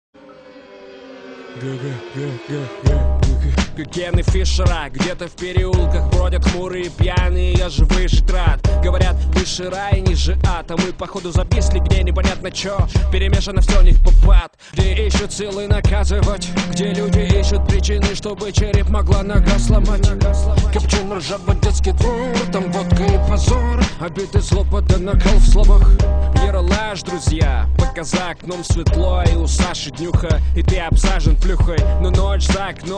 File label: Hip-Hop